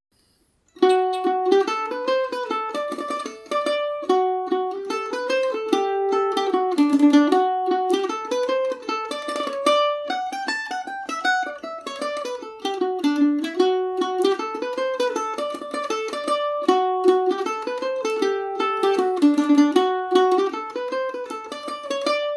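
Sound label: D mixolydian, Irish mandolin; my love is in america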